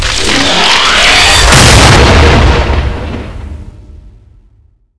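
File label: divine titan explosion, weapons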